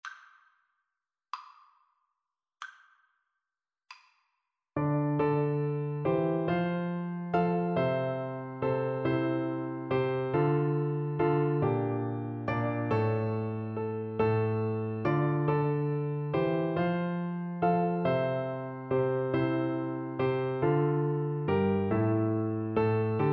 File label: Bassoon